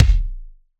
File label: Kick Punch